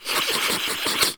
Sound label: Human Male Sniffs While Rubbing Nose